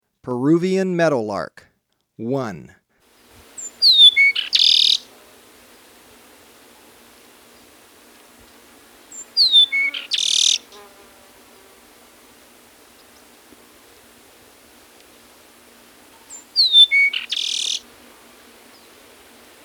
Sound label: Peruvian Meadowlark; S. America